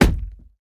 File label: throw dough